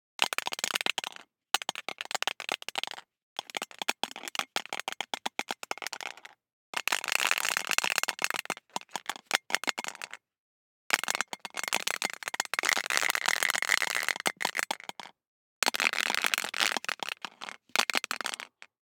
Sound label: DICE in Plastic Cup Closed, Shake, 1 One Die; Samples; ArticulatedSounds; DICE Sfx Party (Extended)